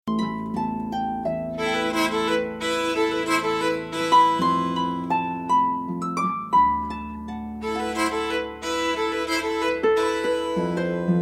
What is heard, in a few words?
schottis